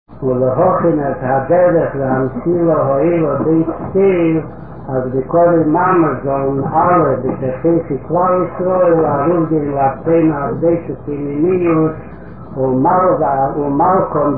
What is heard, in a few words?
hebrew